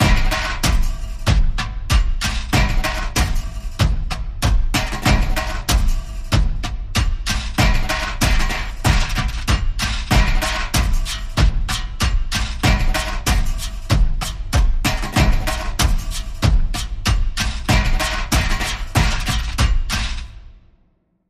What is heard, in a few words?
Tribal Rhythm